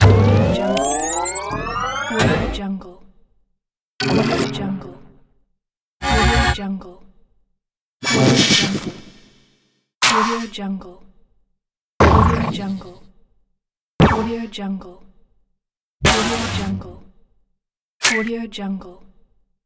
scifi sfx pack

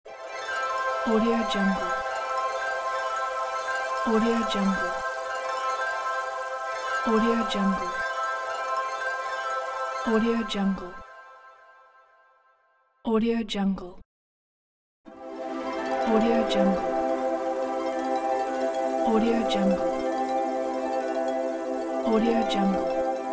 harmonic ambience

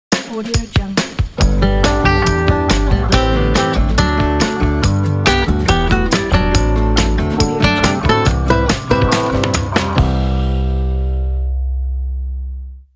ident instrumental creek

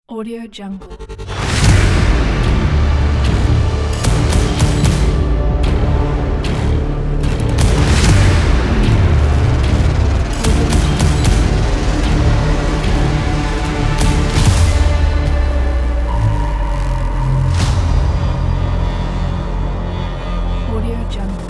energetic epic trailer; soundtree

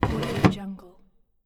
heavy wood sliding into slot

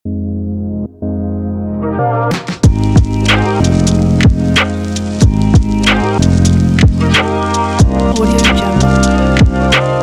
chill beat

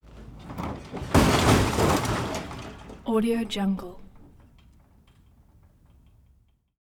vehicle drop

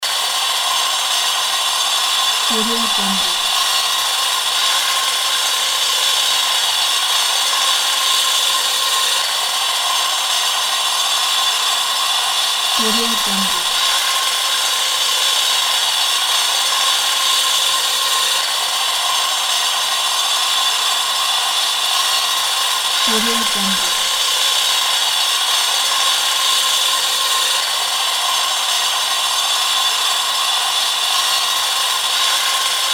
electric saw instrument loop; soundfood

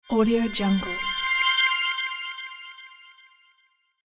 fairy glitter

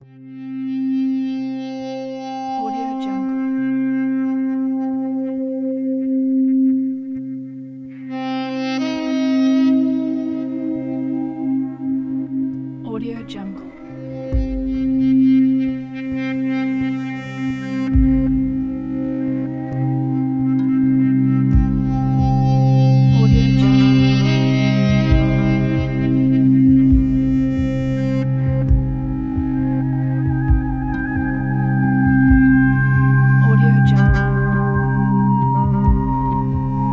drone texture

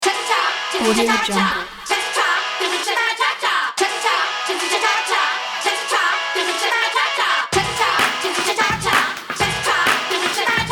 stylish groovy funk

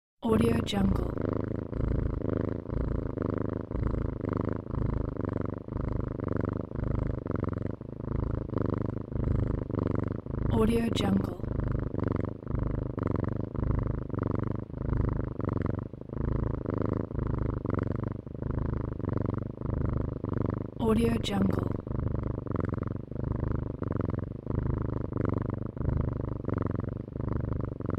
cat purrs

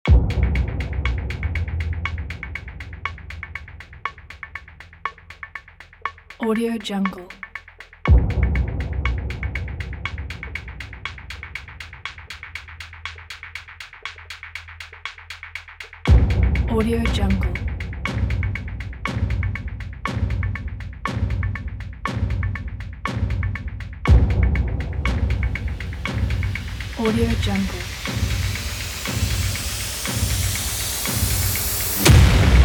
devil trailer

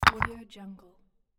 wooden block toys drop

